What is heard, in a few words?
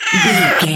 goblin fairy growl frustrated